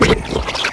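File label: engine loop; vladimir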